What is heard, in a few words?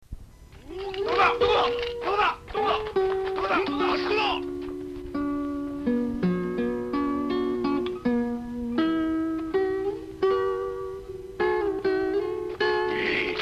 guitar; kotonoha